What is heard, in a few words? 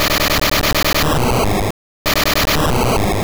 Explosion